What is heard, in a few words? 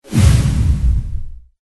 deep fast whoosh; Good Times Memes